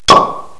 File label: STOPPER; psion cd vol1